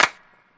clap; Claps

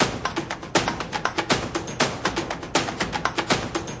120 bpm cinematic orchestral drums

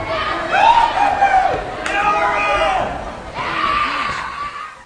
gymnasium enthusiasm; Sound FX Real Life

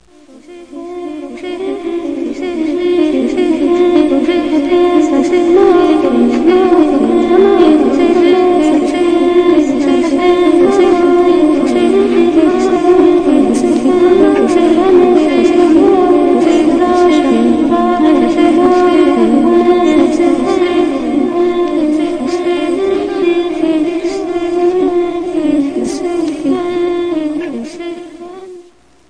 african voices, MALE SINGING; VOCALS and SPOKEN WORD

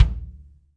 yam kick A2; REAL LIVE KITS

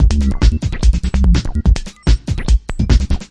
145 fiddlewiddle techno; 141 to 160 bpm

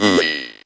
speak n spell ooee, circuitbent; VOCALS and SPOKEN WORD

